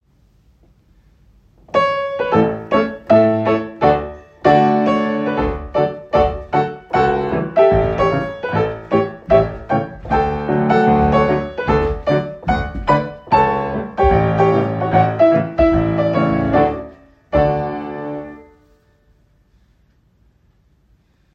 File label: Musica Petit Allegro